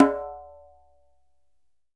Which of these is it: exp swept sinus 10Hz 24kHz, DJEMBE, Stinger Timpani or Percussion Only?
DJEMBE